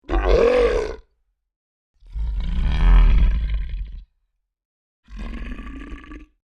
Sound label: Creature Large Vocalizations; Monsters; Horror